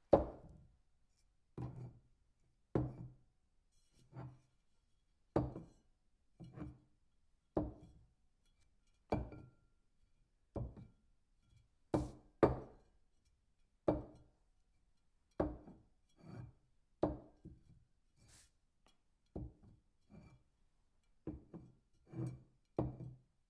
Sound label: wine bottle on wood table